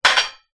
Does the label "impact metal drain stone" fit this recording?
Yes